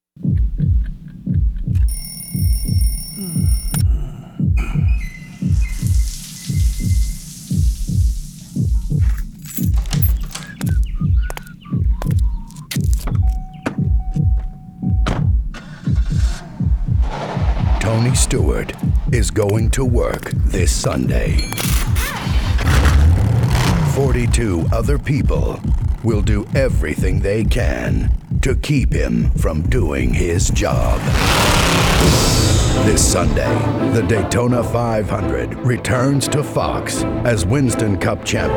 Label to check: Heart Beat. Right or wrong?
Right